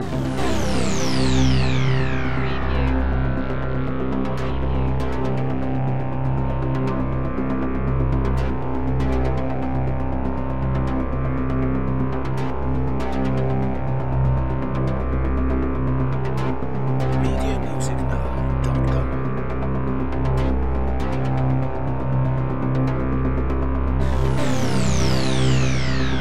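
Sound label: Production Element Bed Synth; Sound Effects Bible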